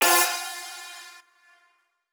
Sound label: Lead Pluck; Break In